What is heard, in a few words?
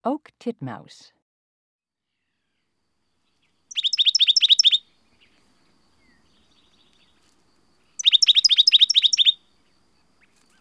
Oak Titmouse